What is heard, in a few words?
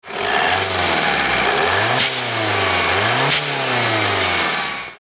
diesel